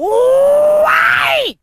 oohyai, Voice; ooh ahh

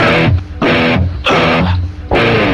guitar, Jazzmatazz loops

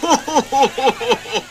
laugh clown; ooh ahh

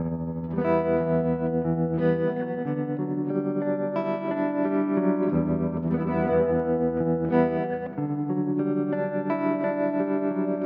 guitar, Sad

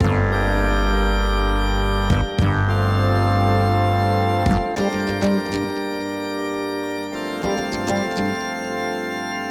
keys and guitar, phonky loops

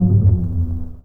bass dirty boom, Loops

